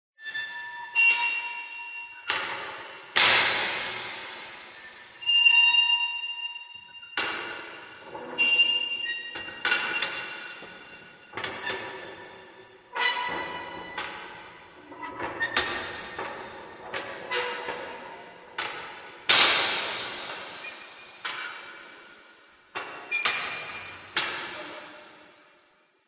metal door hatch large open close movements huge reverb; industrial; zapsplat